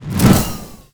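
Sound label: spell frost fail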